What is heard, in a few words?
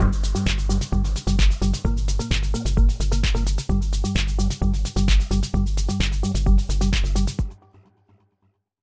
stab with delay and reverb; Tech House; Dark Angels